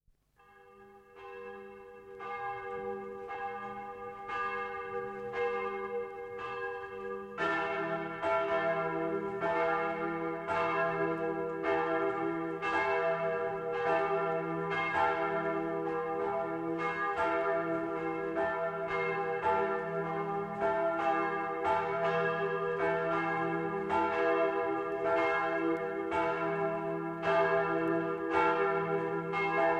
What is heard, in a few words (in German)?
Mariazeller Glocken; Mono